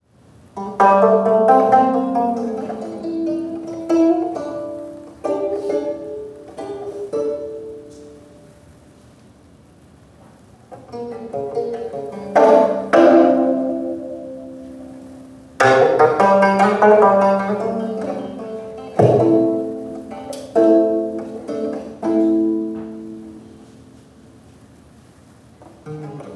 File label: gayageum poems